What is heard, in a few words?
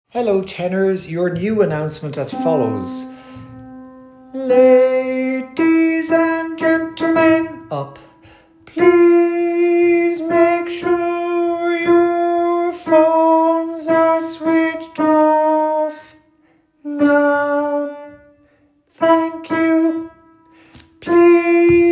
Tenor; Announcement